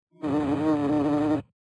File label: Bee Buzzing fast; English Prep Package; DizzyBee